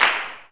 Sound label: crap impact; HeavenStudio; fan Club